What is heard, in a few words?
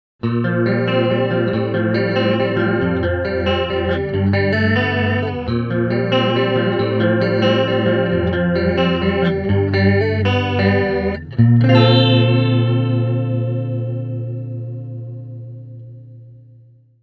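Chorus, Transamp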